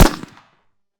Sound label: Gunshot; Hovl Studio; SFX Battle sounds; WhiteMan Unity2D